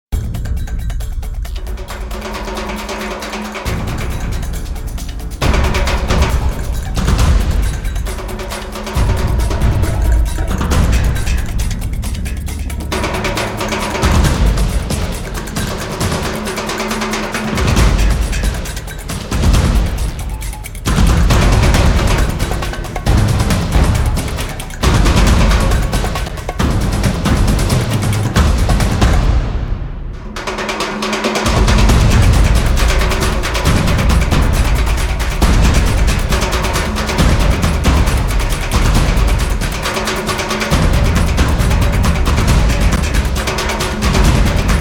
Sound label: Drums Only Version